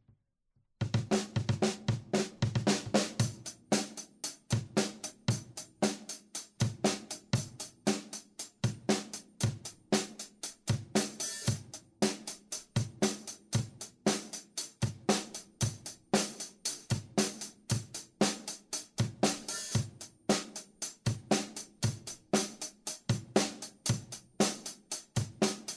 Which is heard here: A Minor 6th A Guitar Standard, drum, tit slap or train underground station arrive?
drum